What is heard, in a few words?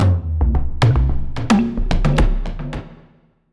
basicMood Cueva Bosque